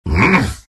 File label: exert; arc warden